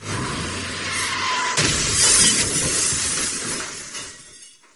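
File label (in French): Crash, Accidents voitures; Accidents voitrues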